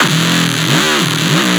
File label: Bass Hit Anger D